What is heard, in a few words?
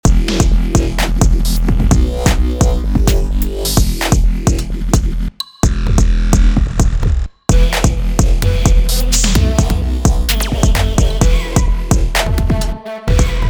Wobbo 129bpm